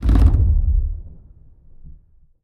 nudge step; rise of maya

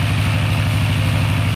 bomber Plane Loop

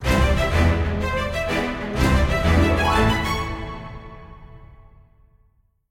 initiate Music; robin hood

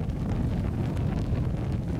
red Flame; hiddencoinsofegypt

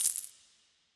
count Up End

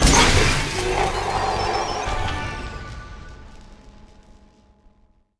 win Medium; wildotron 3000

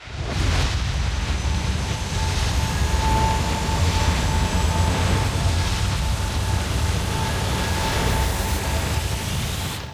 near Win Riser; parthenon

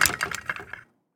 reel Spin End

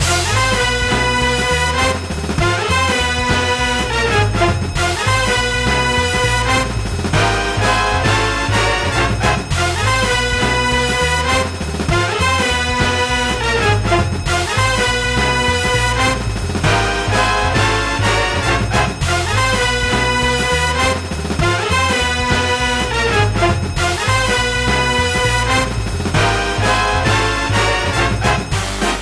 big win loop; piggy riches

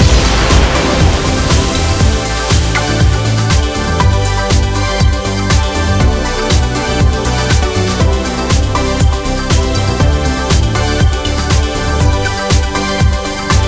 big win; dazzle

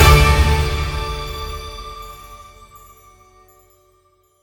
mega Win End; wild bazaar

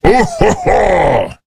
candle Goes Off Screen; rage of the seas

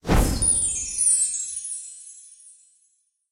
star shoots; alohaxmas

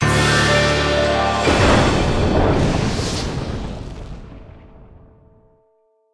free Spin Win Anim; secret of the stones

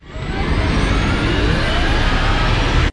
tension Reels Speed Up; wildotron 3000

